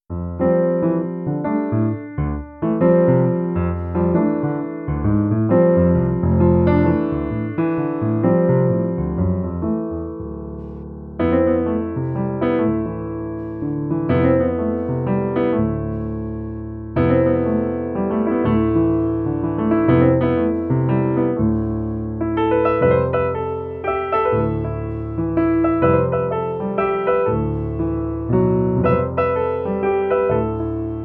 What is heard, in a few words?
waltz